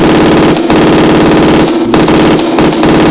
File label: gun